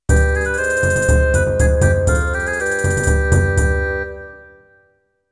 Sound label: Entering Town Jingle (arabic style)